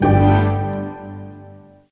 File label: connected sound; msntv 2